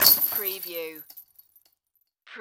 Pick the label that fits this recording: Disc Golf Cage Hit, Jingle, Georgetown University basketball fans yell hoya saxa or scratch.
Disc Golf Cage Hit